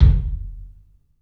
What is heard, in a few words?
KIK, LIVE CLUB KK, Drums; 3D Sound